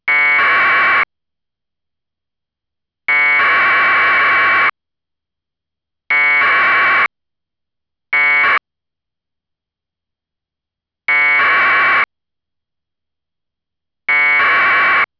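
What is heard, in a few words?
APRS, Hobby Radio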